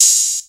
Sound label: Hi Hat Open